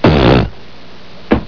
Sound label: fart